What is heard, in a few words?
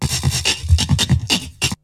BREAKBEATS, TECHNO